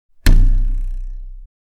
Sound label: Cartoon twang ruler wobbling; boing